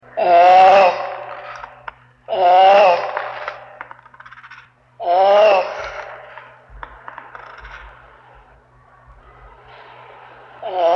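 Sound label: cherepaha golos, turtle voice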